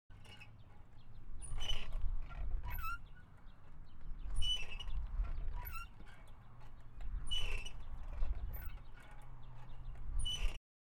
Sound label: Man On Swing